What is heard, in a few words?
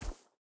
wolf footstep grass hard; Rust